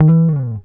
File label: GTR TRIK, Jazzistic; Univers Sons